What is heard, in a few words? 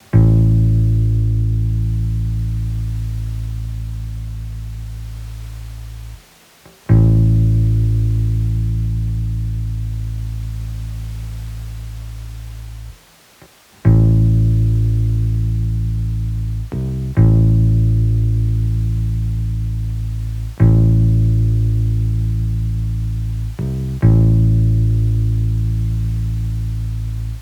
music loop bass 70 Amin; macabre candelabra